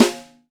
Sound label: New Jazz Snare; Snares